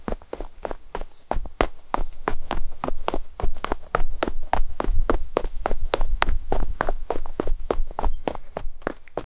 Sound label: army advance footstep